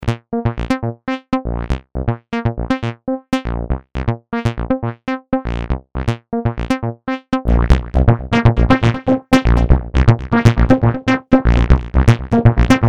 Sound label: BassLine; Magellan MT